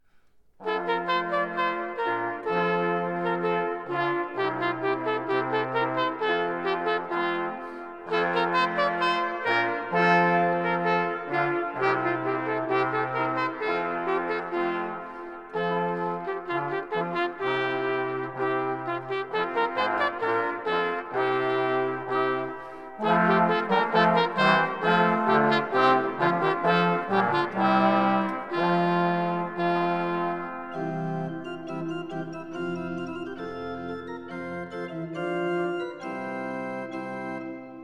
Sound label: gavotte; kantate2020